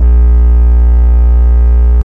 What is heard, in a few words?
TRAX BASS, Hard & Loud Techno; USB Soundscan vol.01; AKAI